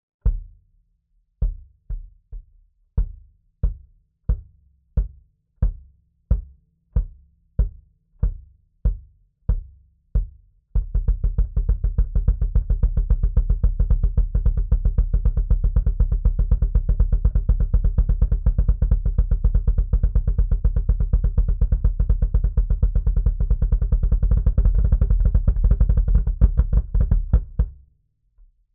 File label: Sub Kick